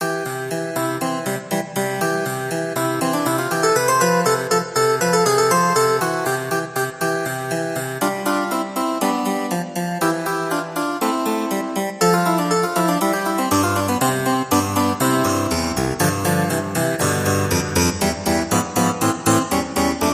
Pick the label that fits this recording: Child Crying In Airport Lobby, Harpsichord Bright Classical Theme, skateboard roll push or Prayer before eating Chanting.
Harpsichord Bright Classical Theme